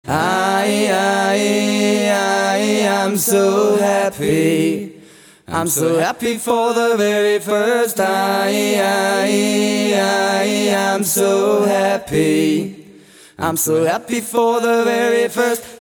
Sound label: happy hook line